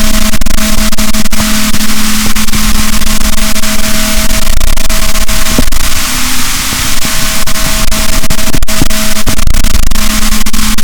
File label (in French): rorquals, boat, Balaenoptera Physalus; SNR 6dB